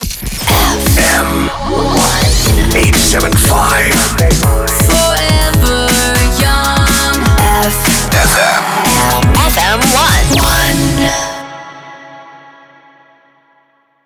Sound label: Jingle 122bpm Cm Main ID; 87.5 FM1 Manila